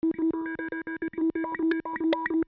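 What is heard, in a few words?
control tone; KATANA; Daikatana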